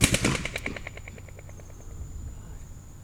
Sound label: Handclap